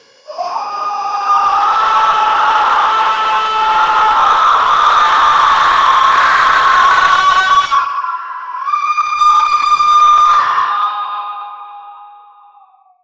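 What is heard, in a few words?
inhuman scream creepy horror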